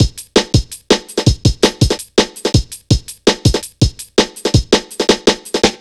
landcruiser, Drum Bass Drumloops 165bpm; Zero-G; Total Drum Bass

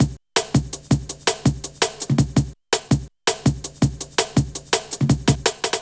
165, Breaks; EZRollers

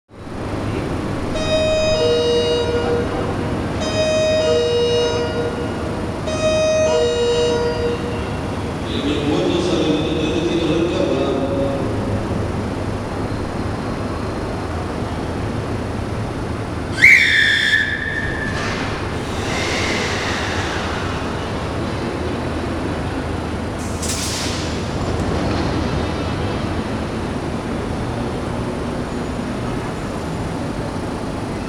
Salsa Picante; Masterbits; World Zone VOL-3

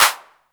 DR-660 Perc, Drums, Boss DR-660

